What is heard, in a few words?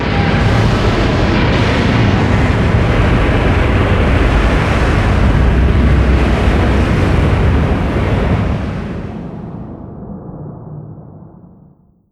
distance super heavy battleship destroyed; Hearts of Iron IV Death or Dishonor